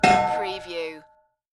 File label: Big Old Bell Single